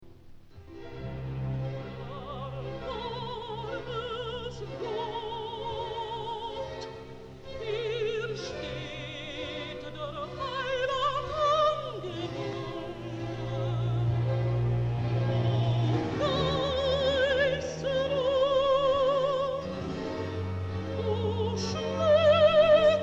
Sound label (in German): rezitativ; Bach, Johann Sebastian; Matthäus Passion; erbarm es gott